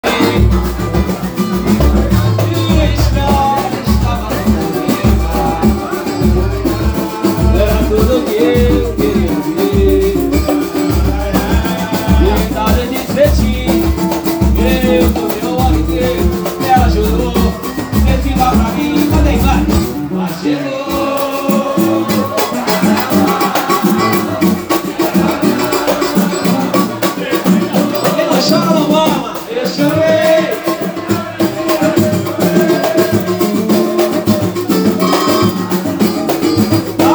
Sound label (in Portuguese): Samba, Samba raiz no bairro das Rocas.